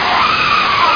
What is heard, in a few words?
ski; NewSurfs